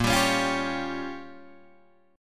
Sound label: A sharp Minor 6th A sharp down Guitar DADGAD, chord